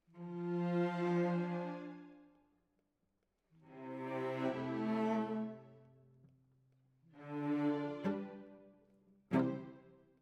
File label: Cellos